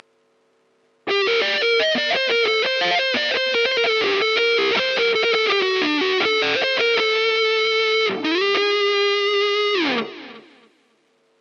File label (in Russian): TapeDeck, Играем на гитаре